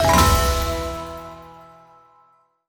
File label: low win; netent